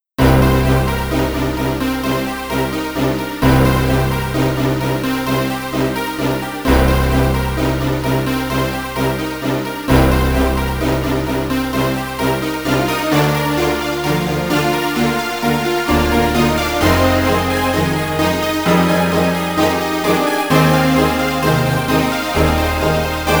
Menu Song; thor god of thunder; ds

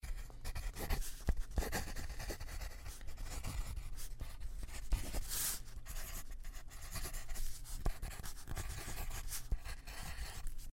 writing; The 39 Steps

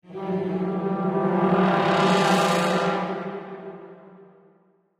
Level Start; Angry Birds Star Wars 2

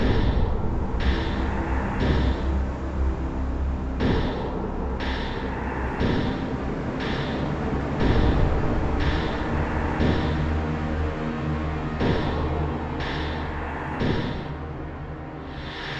Danger Tonal; Insanely Twisted Shadow Planet

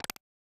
Tile; Kabuki-chou Reach Mahjong Tonpuu Sen; SNES

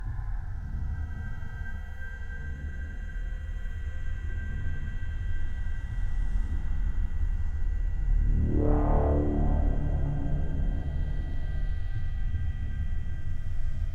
sun drone

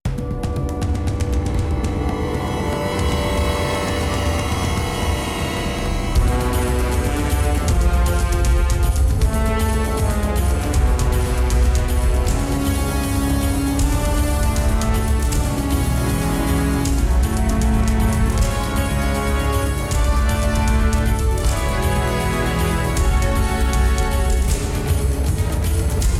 Boss Driver Battle

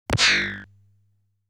Enemy Fires a Bullet

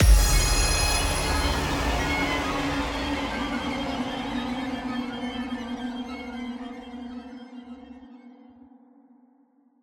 Boss Fatality Music; Song of Horror; Windows

